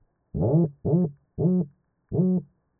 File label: leopard toadfish